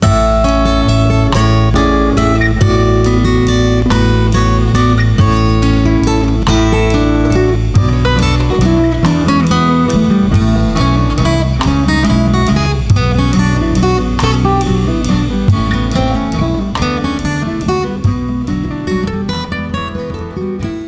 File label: Pretty acoustic guitar instr